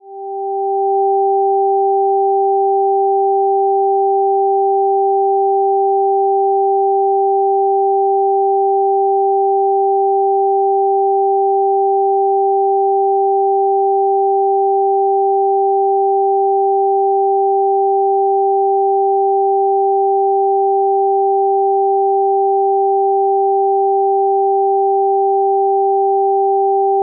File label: octave illusion; deutsch